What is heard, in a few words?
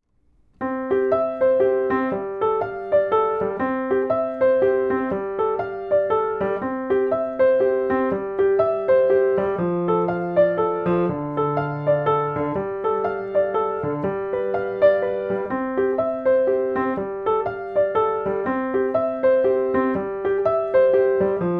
Piano